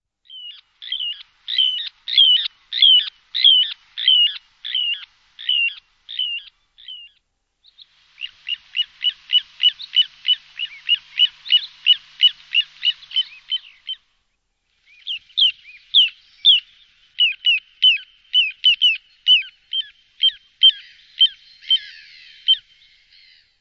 petit gravelot, oiseaux chant

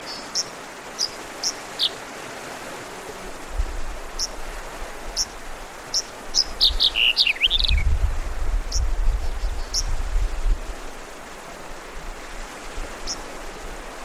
bruant melanocephale; chant

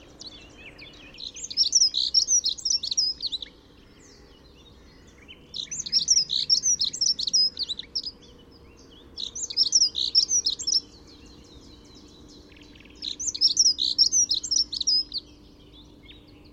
accenteur mouchet; chant

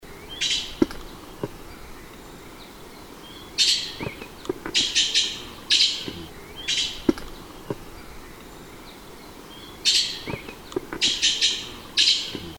pirolle a bec rouge

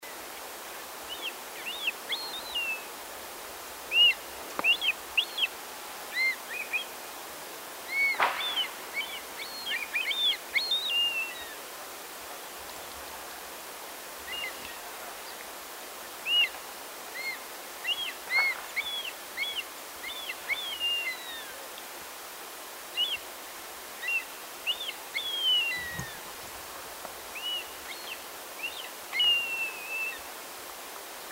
bondree orientale, chant

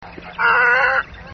aigrette garzette, chant

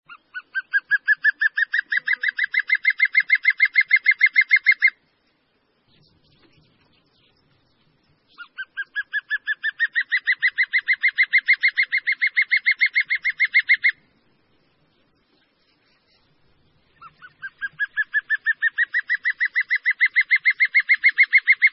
autour chanteur, chant

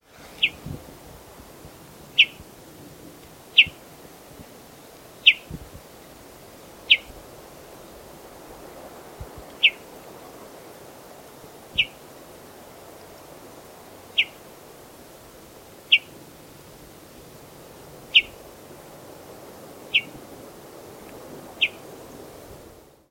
merlebleu de l ouest, chant

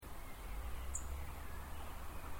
grive musicienne

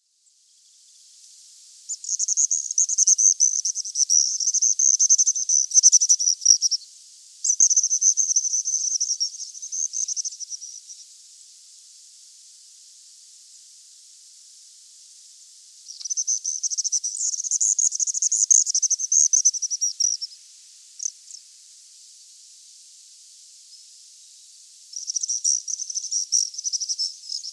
martinet des andes

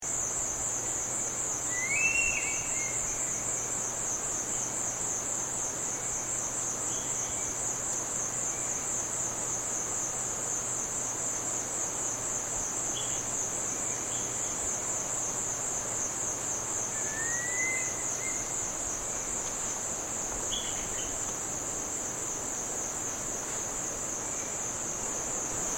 serpentaire des philippines

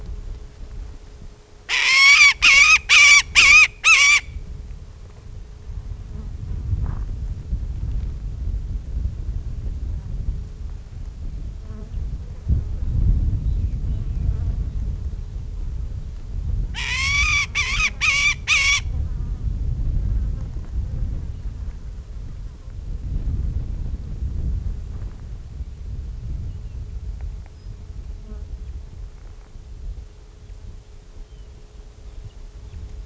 buse a queue blanche, chant

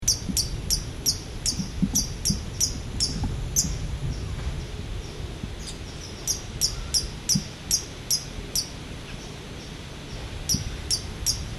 ariane aimable, chant

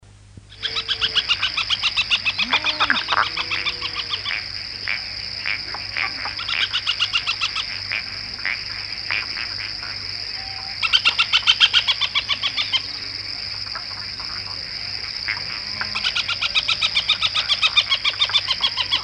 busard bariole

